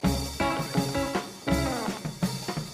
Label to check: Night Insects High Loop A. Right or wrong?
Wrong